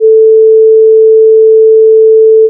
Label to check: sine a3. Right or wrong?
Right